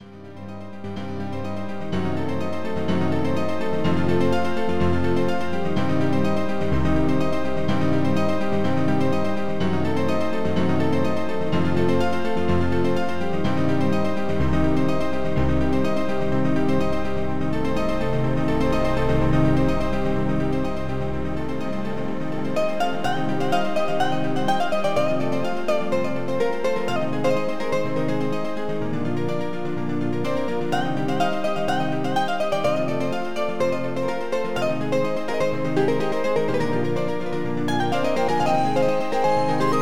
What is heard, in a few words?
piano sound